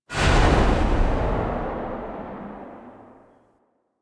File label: Shotgun Tail; Warframe; Tenno; Lotus Pod Shotgun